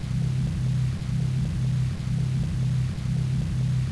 idle, weapons; tfa cso; heaven scorcher

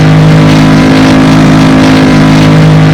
simulated vehicles low

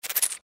chase vox; npc

